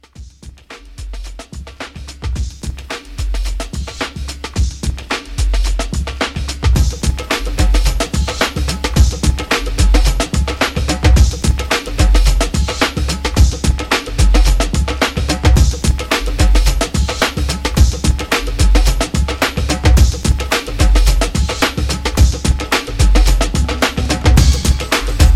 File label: Bhangra 90s Dance Re Drum 109 CLEAN